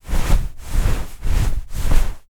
footsteps single cloth armor movement soldier; empire game; walking march

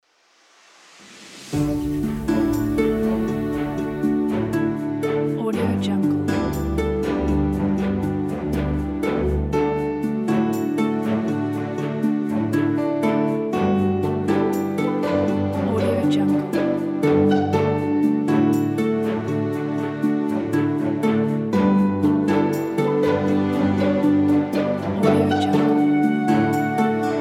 fun kid games

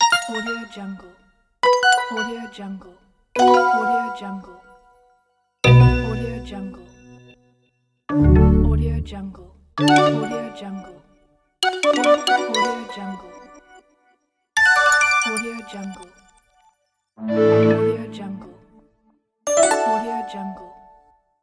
button interface sound

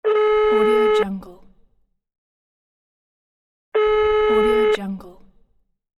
phone beep signal ringing waiting tone

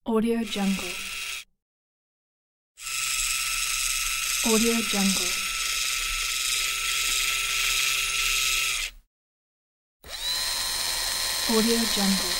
robot

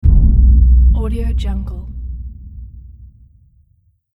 subsonic impact

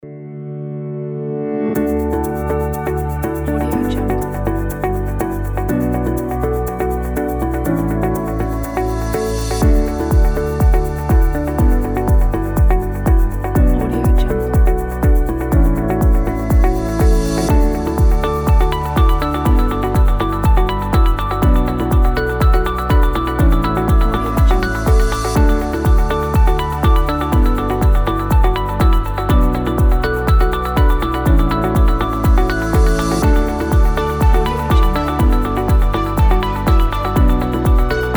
background happy

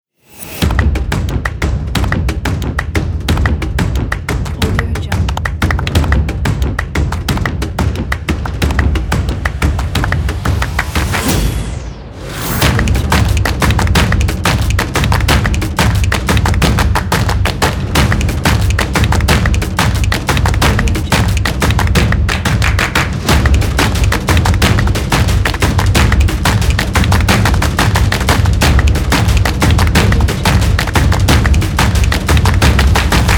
claps and percussion

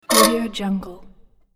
metal blade rough

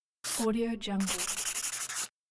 pencil

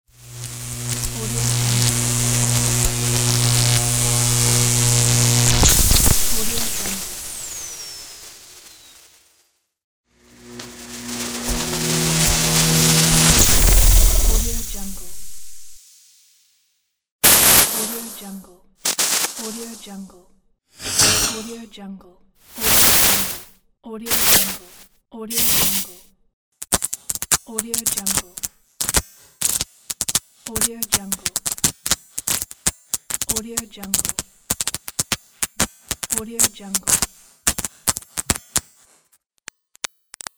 electric; volkovsound

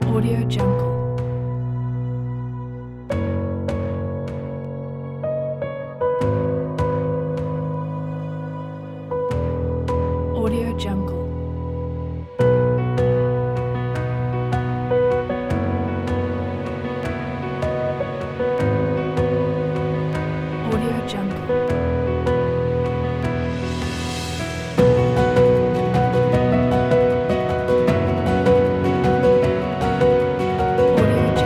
motivational and uplifting piano; music waves production

